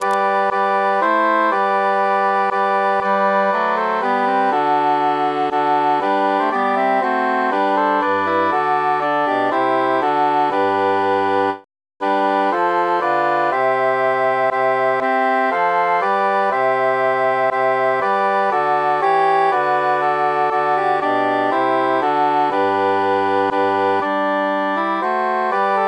tenor mute; sing we and chant it

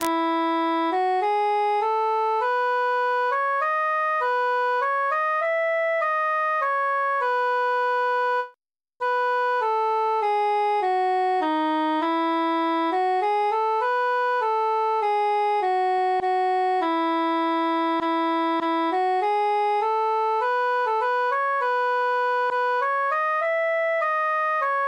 cantus solo; transposed; we be three poore mariners

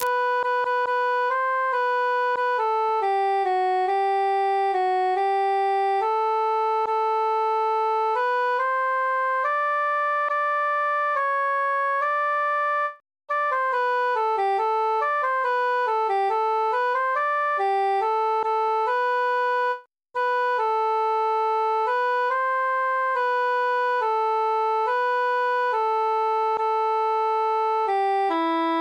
altus solo; come merry lads

